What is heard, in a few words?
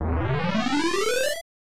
Erase (Zoom In); mario paint game rip